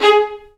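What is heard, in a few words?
Vio spiccato G#3; Digital Sound Factory Virtuoso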